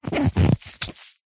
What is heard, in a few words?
wet cloth handle squeak squelch short; foley; zapsplat